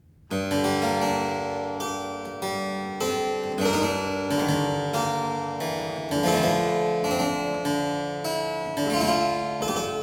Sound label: Praeludium to the Fancie (harpsichord); Byrd; BK12 [third version]